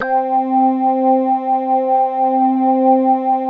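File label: JUNO ORGAN, PADS; Masterbits; Vintage Voltage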